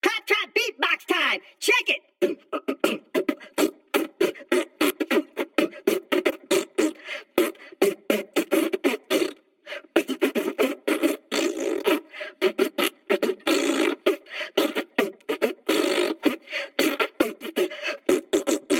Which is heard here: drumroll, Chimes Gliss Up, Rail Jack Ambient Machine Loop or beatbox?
beatbox